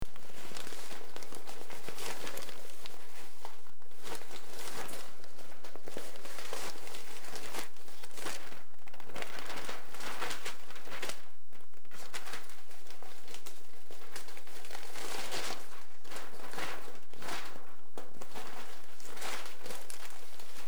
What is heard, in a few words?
newspaper